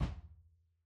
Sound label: kick drum